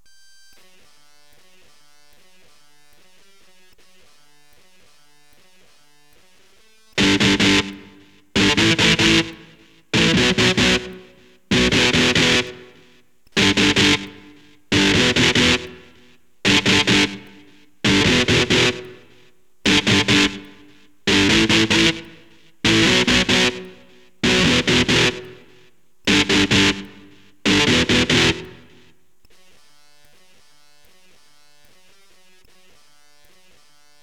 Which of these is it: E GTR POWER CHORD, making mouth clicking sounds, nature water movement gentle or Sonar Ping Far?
E GTR POWER CHORD